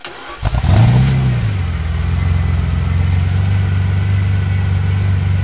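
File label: Ferrari Testarossa Start Loop, Automobiles; SFX Kit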